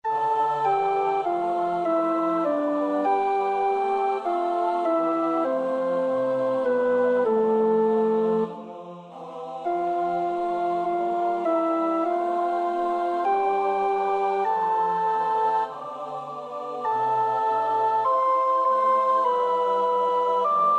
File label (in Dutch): Psalm 125 (alt 2)